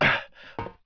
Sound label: ladder; PC Gamer IT CD 41; Daikatana